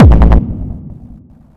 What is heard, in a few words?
techno g